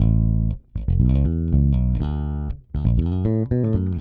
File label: Pop, Bass